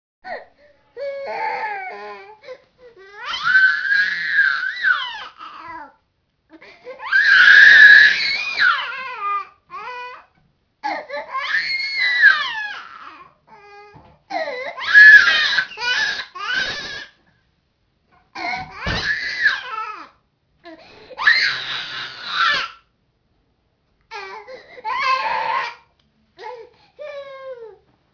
Baby screaming cry; Babies Crying Laughing

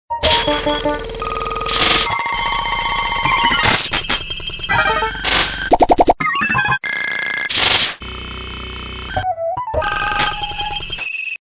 Computer processing

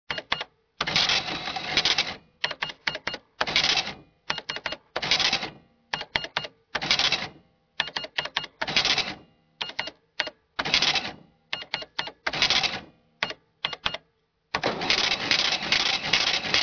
Electronic register

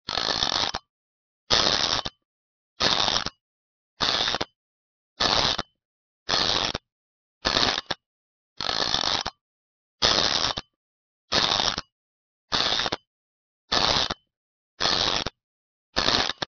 Wind up clock sound; alarm clock

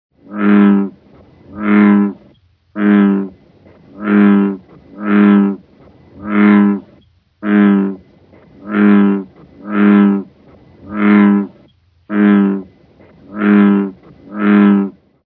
Bullfrog croaking; frogs toads; tadpoles amphibian

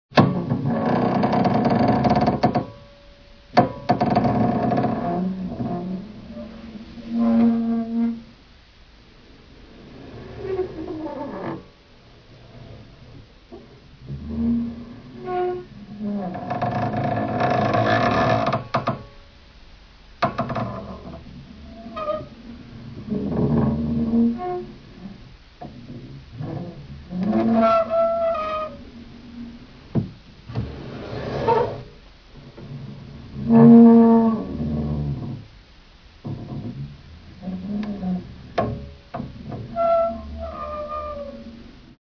wood creak

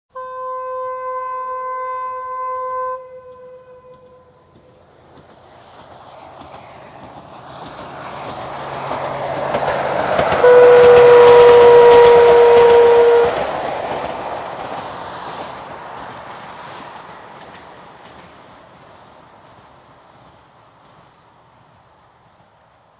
Train passing; Trains Railway; Train Signals Rolling Stock

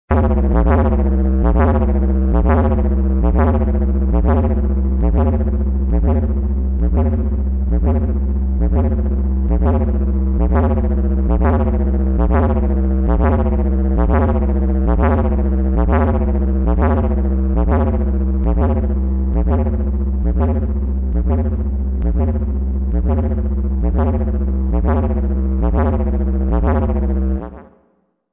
UFO engine; aliens

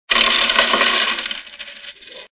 Coins on the table

